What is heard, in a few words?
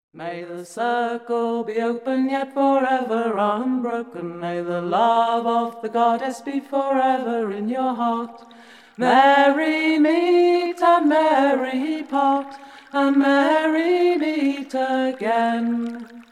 celtic goddess blessing